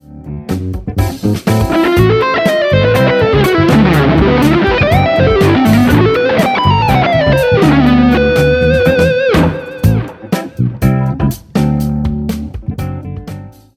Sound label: Lick